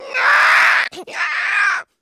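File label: Monster Creature Growl, foley monster vocals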